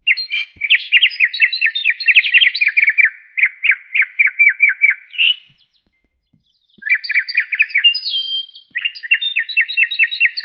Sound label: puffin bird natural